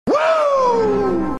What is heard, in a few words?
Woo; Ric Flair